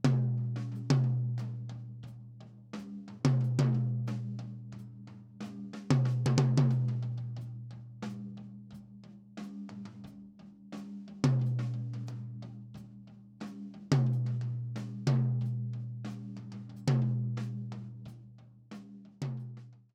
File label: Tom